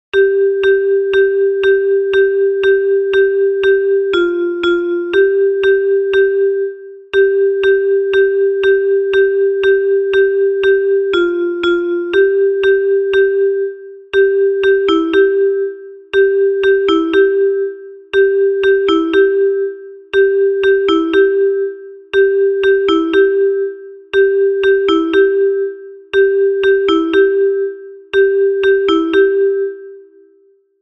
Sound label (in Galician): Metalofono Contralto